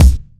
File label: studio kick single hit c key